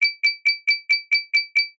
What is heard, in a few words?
game sound jewel treasure or glass tally slow